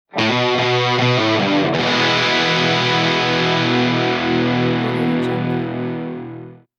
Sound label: rock guitar intro